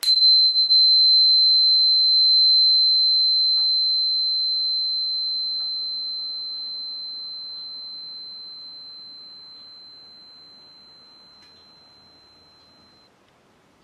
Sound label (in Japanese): 4096 Hz, クリスタル・チューナー; 地球の基本振動 （8Hz） の 9オクターブ上の 4096 Hz; 音叉で水晶を叩いて浄化します。; 天と地を結ぶ「天使の扉」を開く音域